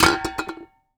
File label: Empty Tin, household rhythm samples Hits